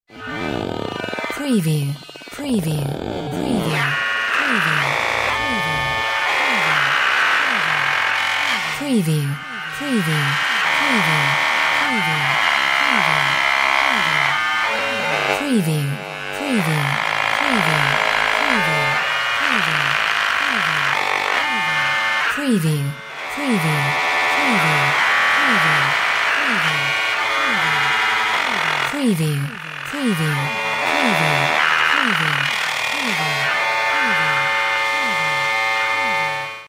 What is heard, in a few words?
SCIFI MECHBOT CONFUSE